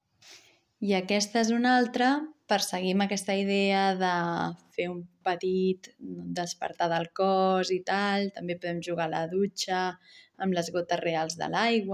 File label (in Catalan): les gotetes de la pluja tapping; Recopilatori de cançons i rimes per a banyar-se i vestir-se